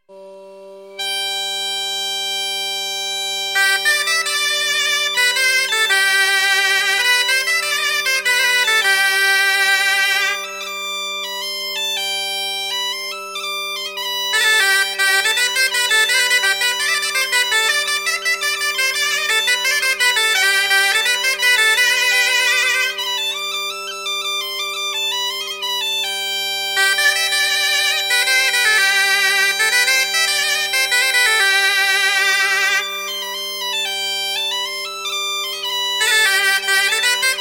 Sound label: Plinn Bal